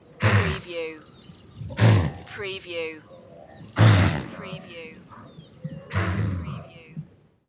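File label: Wildebeests Snorting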